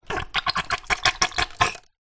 household bleach squirt from bottle into toilet bowl